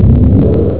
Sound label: elevator up; ParadroidII